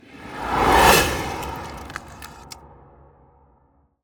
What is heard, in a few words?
Ice Spell Whoosh, Cold Whooshes; Arctic Aura